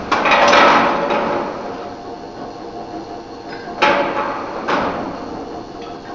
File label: Pipe Machine